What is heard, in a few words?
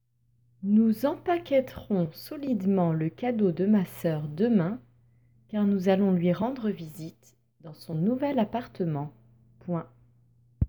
Vocal